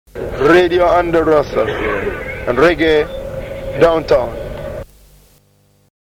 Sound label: Reggae downtown; DOWNTOWN JINGLES